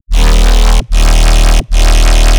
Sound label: BASS SHOT